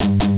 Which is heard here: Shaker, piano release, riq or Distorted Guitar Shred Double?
Distorted Guitar Shred Double